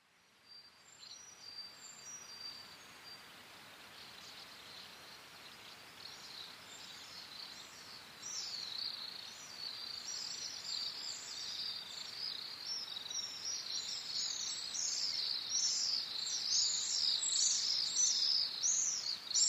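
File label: white-rumped swift; amatubame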